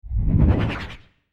booming flutter wave; sound ideas

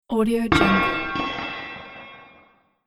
dungeon underground metal noise

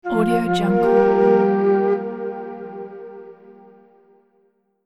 native american flute

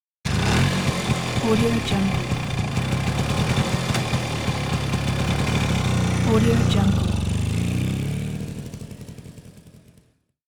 motorcycle starts and running

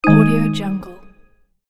message error

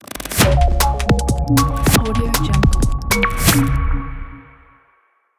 glitch intro logo

